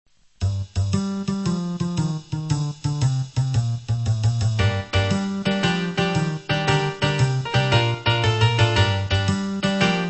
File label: jazz